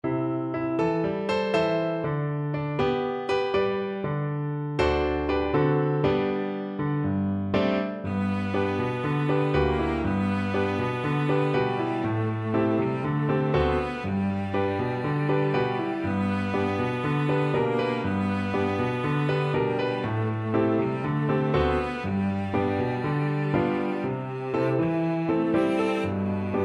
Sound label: Violin-Cello Duet